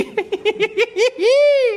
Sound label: hehehehe; andrew garfield